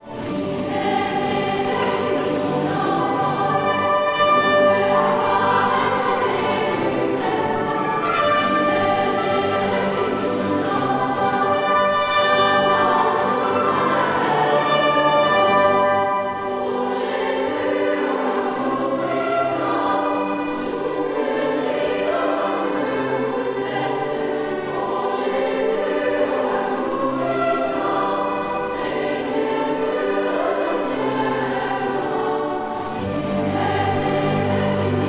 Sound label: Chor Orchester Il est ne; Weihnachtskonzert